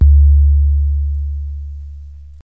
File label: dull supaboom; Kicks; single hits